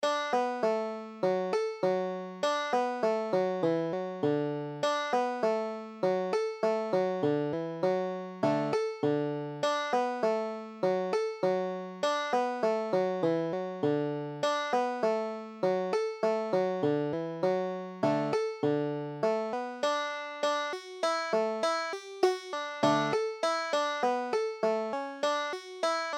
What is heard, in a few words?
dry and dusty, banjo